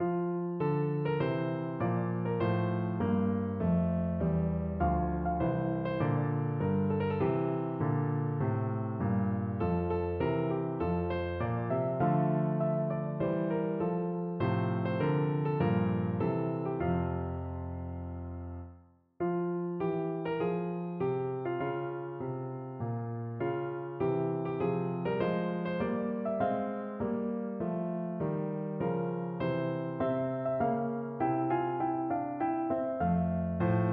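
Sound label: pakistan national, piano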